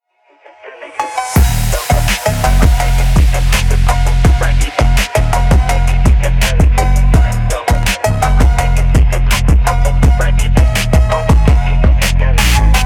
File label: phonk phonk 2025 mix